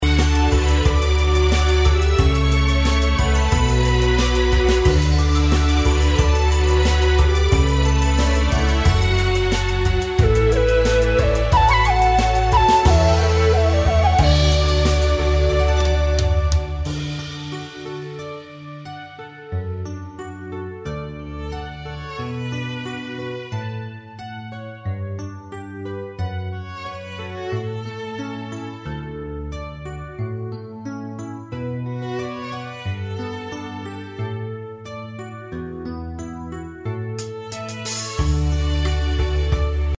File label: game Bgm; jiandangbainian